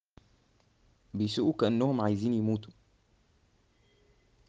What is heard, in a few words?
traffic